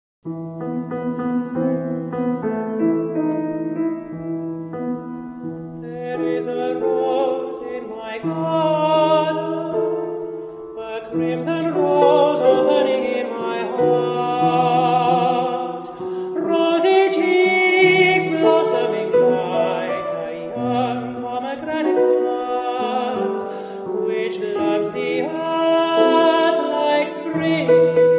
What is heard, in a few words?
with piano (e minor)